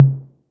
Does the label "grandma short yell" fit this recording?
No